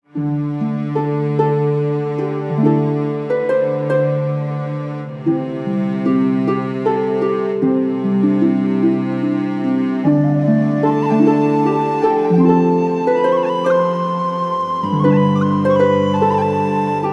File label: celtic lullaby